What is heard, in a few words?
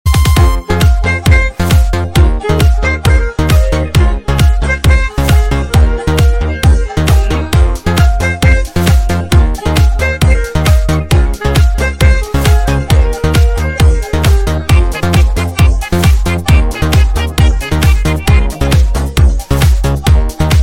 Instrument